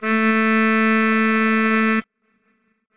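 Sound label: harmonic test signal separated; Separation of Sound Sources by Convolutive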